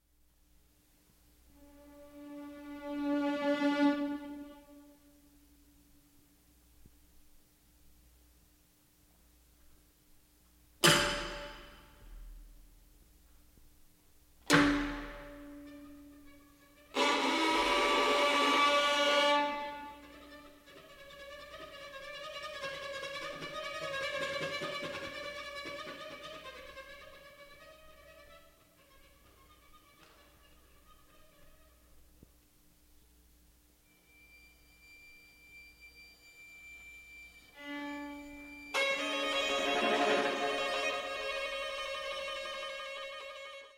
Quartet For Strings Tranquillo

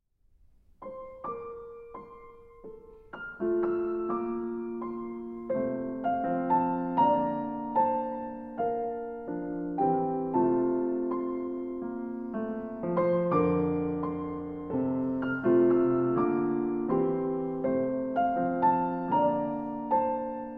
piano duet; Edwards A Flight of Sunbirds Nine Bagatelles; IV. Poco lento

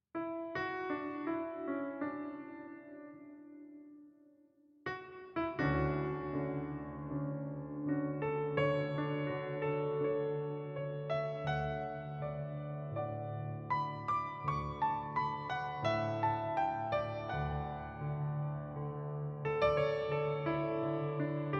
Smooth Jazz Band

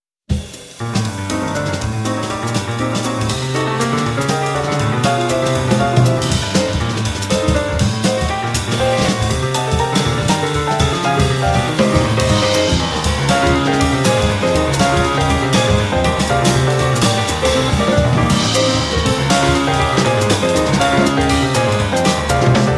In G Minor, Jazz Ensemble

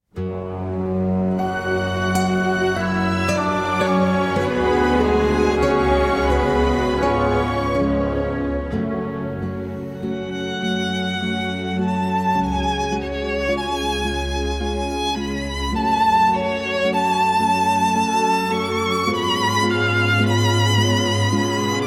Violin Sonata in A Major; Op. 2, No. 6; Veracini

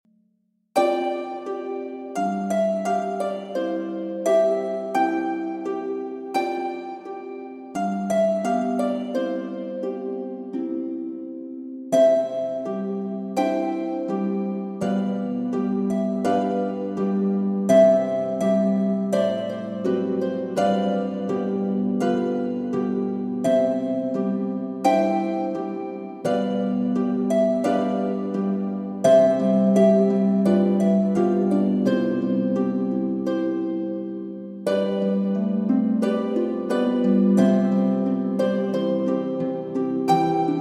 Lullaby (Harp)

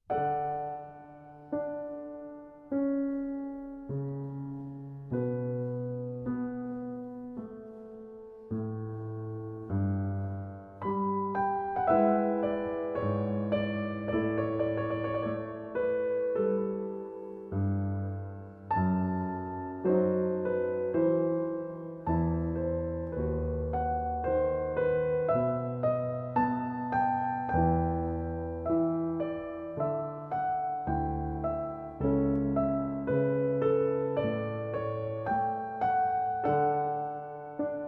Orchestral Suite No. 3 in D Major, II. Air; BWV 1068

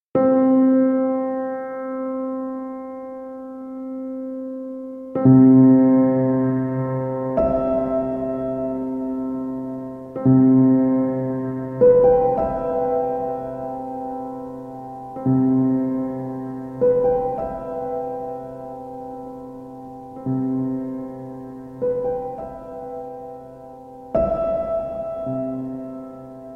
Piano Sounds

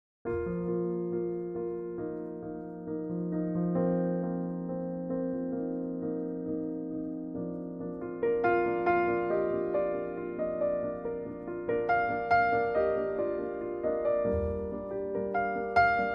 Piano Version

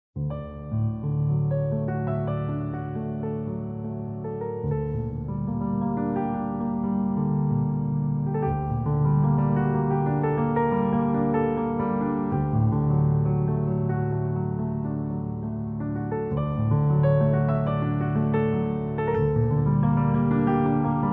Solo Piano

